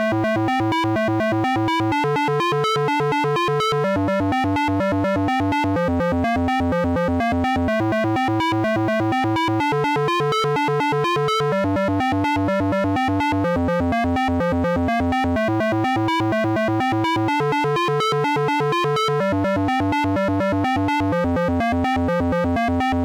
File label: menu